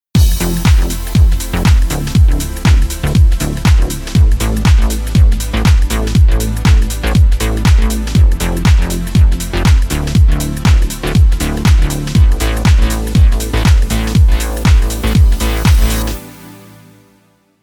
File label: Synth Side Chain On Pad